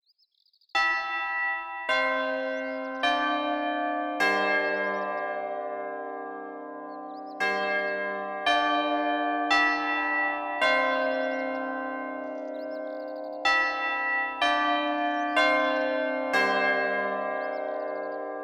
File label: bells